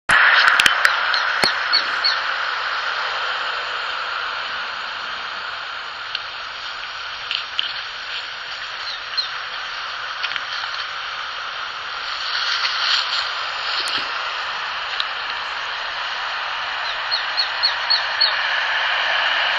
ツミ巣立ち雛; 大庭

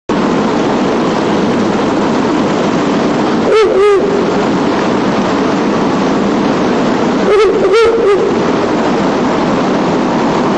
フクロウ♂ 少年の森

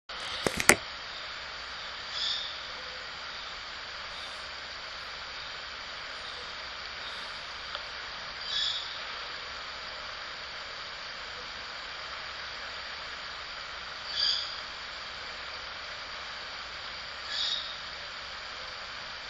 フクロウ雛

